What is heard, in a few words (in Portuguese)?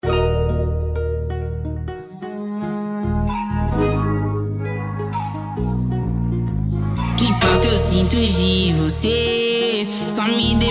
funk consciente e hip hop